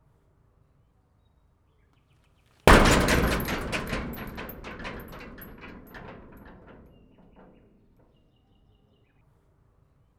kapuba valo belerugas

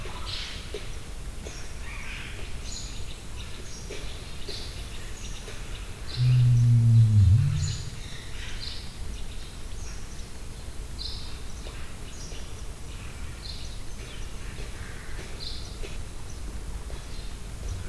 emu kakas erdo hangulat, nandu; miskolci zoo 2009

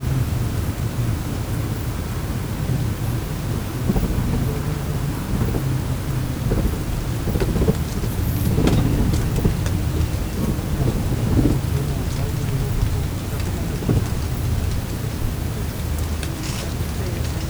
barlang többen halk beszéd, denevérek repülés; termeszetben

